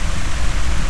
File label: halon, aircraft